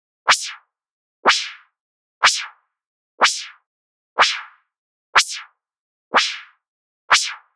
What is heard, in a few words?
Whisp Clap